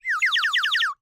Bird whistle, clay, painted, water, airy, wispy, phrase, excited, happy, rapid, very short; Eiravaein Works; Vaeyan IV